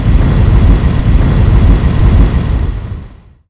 flame; Armored Speakerman TITAN

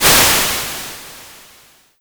tentacle steam; sprunki simon pack

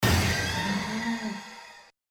Jumpscare; MommyLL; copycats; PandaClones Jumpscares

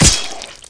ninja sword hit; undead toilet

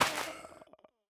break, soulsand; The Monster Plane

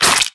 gore; dumpster baby titan

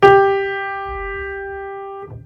key, pianosaurus

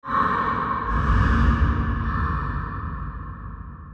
MONSTER SPAWN; zoochosis; lazynap; whalekiller deepsea monster

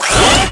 walker small mvnt leg; squid game pro jjk; squid game doll

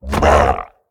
pain, procoptodon; zoochosis pack

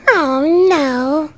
hunter death; catnap n grabpack; elmo

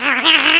pre attack; The Monster Plane; kolmods preatt

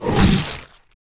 bite bear; zoochosis; deep sea; lazynap

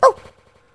hunter pounce cancel; catnap n grabpack; elmo

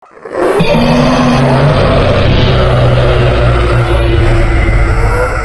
SCREAMER; amy tapes; SONIC TAPES

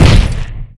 step; Armored Speakerman TITAN

